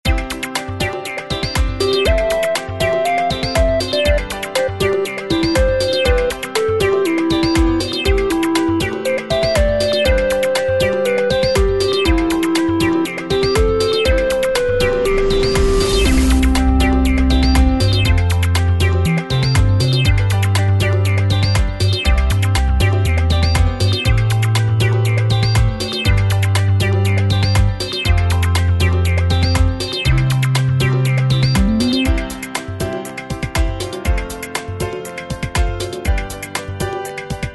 bgm brick Start; Kardia Fantasy Mamono Hime-tachi to Bouken Monogatari; android

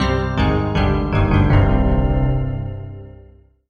Solo EPiano Negative

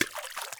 Splash; blastmorph pinball; xbox series xs

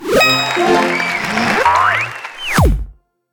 Win; Elastigirl

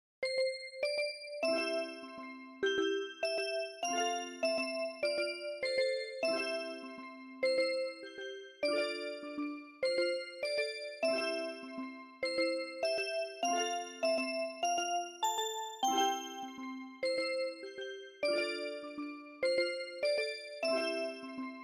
MIDI Scene

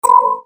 Match Connect Nuclear; Godzilla Smash3

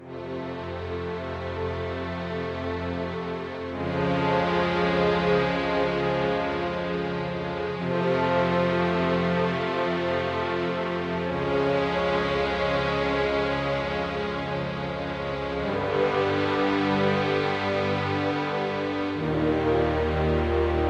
Cello Loop

